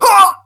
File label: pain; awesomenauts